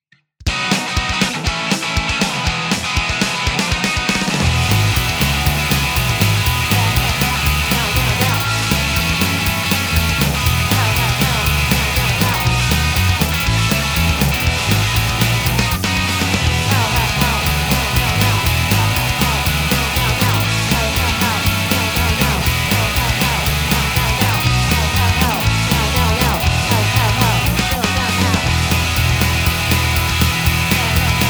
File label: Ambient